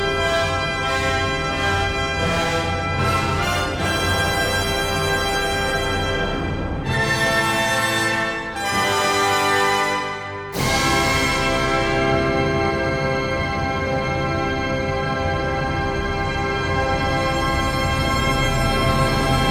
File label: High School Music Band; Also Sprach Zarathustra